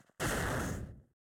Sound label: slam; AuroraEngine